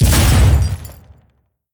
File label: Building Part Smashed